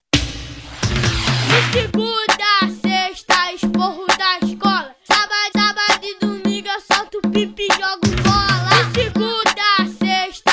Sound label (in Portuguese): sound car; reboque poseidon sartori